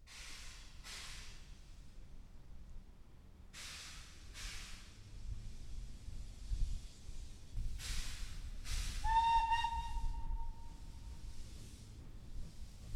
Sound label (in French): locomotive